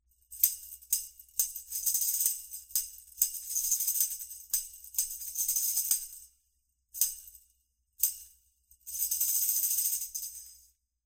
Tambourine; Pronounced Climate Change

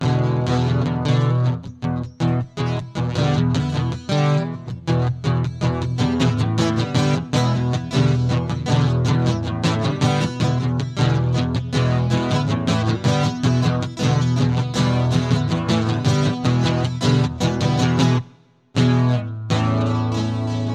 acoustic

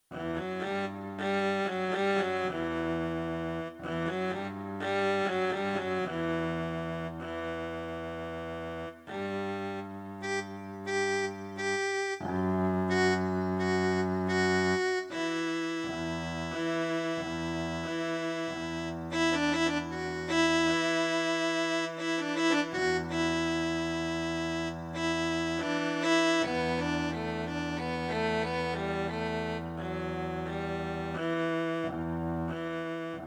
Fiddlin fiddle

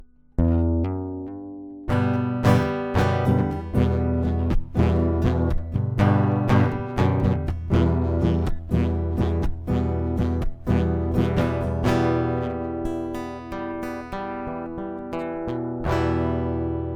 Fiddlin acoustic

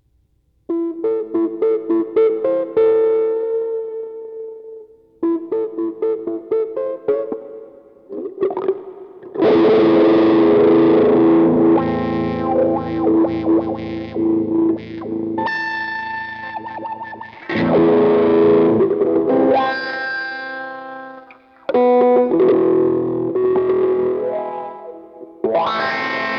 Winter electric

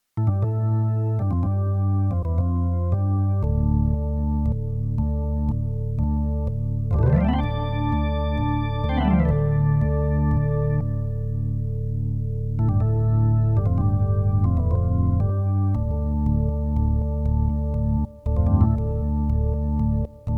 electric

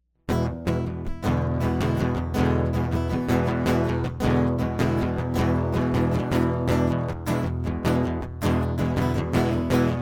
acoustic; But Youve Got Some

